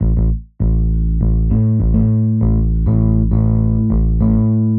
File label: X Bass, Rock Bass